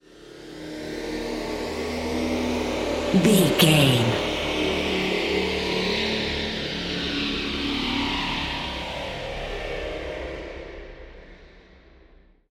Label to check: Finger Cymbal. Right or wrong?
Wrong